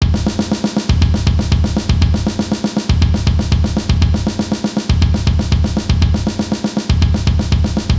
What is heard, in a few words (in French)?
Break